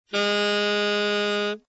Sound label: A sharp 3, saxophone tenor